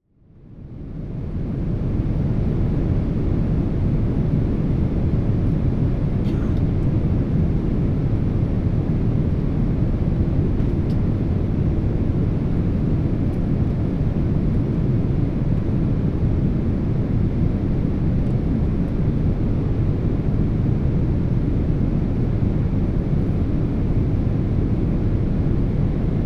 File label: boeing 777 ambience unfiltered; airplane inflight audio recordings